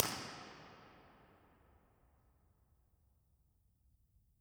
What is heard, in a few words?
factory hall